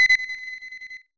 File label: skyrunner; mother2